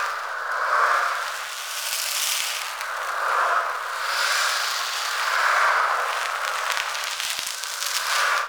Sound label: Ashen Spirit Death Trail Loop; Enemy